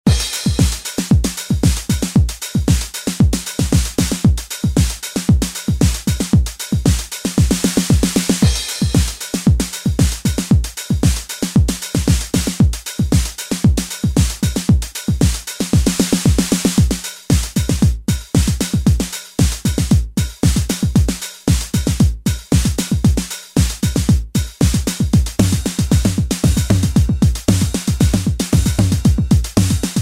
TR909 drum loops

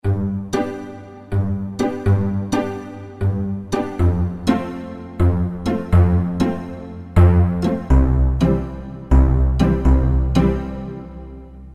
d-50 pizzagogo

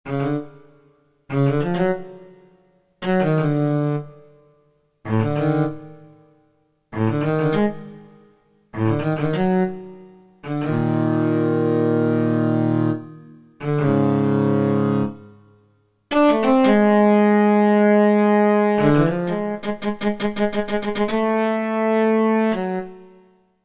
d-50 digital cello

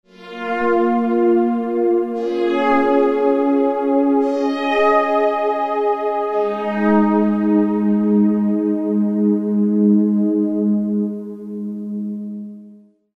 d-50 soundtrack

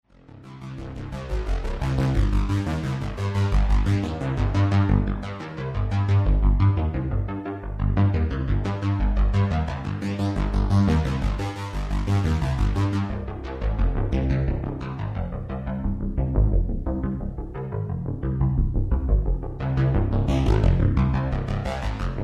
Synthex bass

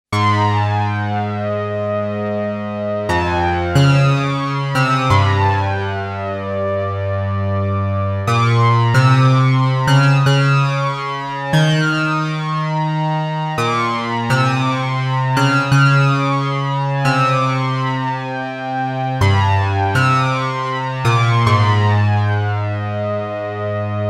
Virus KB laser harp

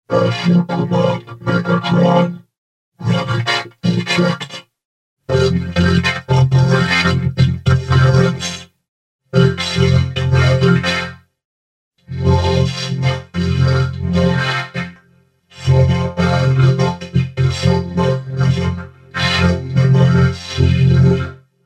virus kb soundwave

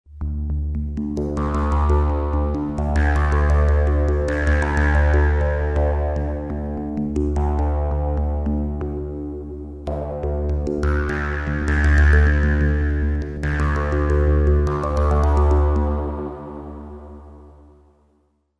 d-50 reso release

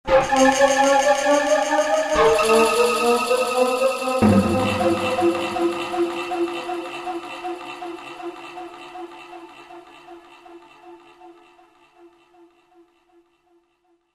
dense forest, D-50

